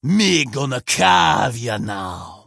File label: melee; Halazzi